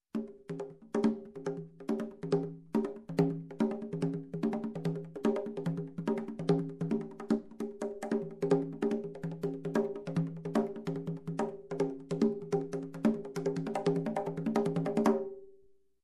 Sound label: Conga Groove